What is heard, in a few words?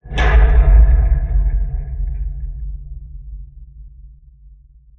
structure blunt; Barotrauma; Subsurface; Damage